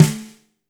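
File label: fat snare; Spectrasonics; Burning Grooves; DRY KIT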